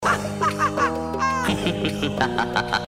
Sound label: Clown Laugh; Insane